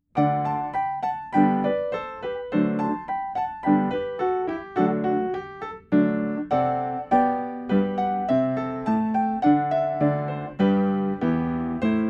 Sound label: ACCOMPANIMENT